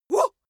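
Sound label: female attack auh, screams; schreie; menschen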